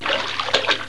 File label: SPLASH